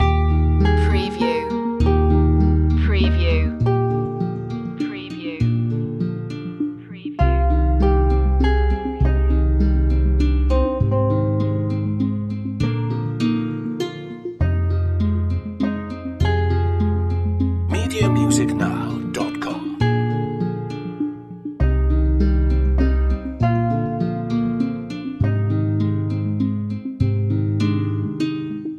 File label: yellow guitar loop